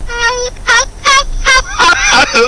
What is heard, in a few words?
cursed hahaha